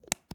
Medicine Glass Jar; Medical; Blastwave

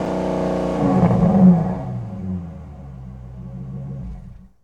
Truck Ford250 Fast Off; Trucks

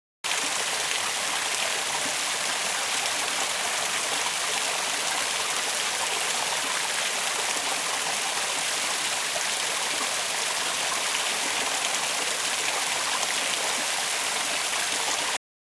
water stream medium

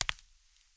Tile; blindside

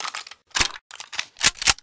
Gun Reload Weapon, Weapons Foley; SFX Kit

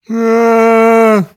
moan; Pitch Black Audio Pong

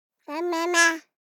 BABIES TALK Boy 12 months Am Mama; Baby Boom

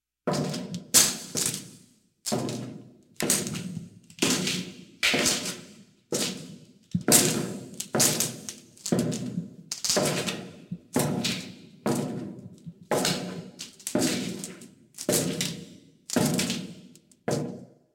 Footsteps In Hall; Halloween Havoc - Sound Effects; Ktel

